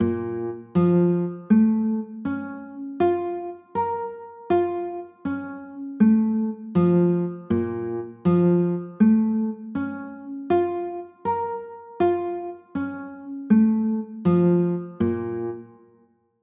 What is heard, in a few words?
b flat m maj7, chords